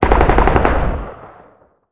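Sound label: weapon anti-aircraft gun firing distant long; Explosions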